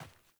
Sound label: Sandals Run Dirt, Footsteps; Characters; Player